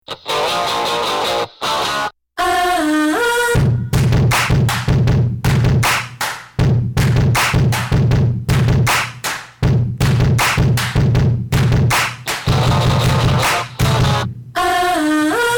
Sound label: guitars